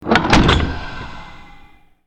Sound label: Ruins Temple Locks